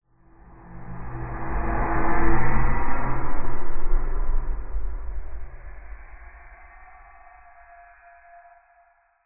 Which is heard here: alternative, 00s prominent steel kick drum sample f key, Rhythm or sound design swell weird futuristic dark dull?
sound design swell weird futuristic dark dull